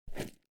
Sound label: weapon zoom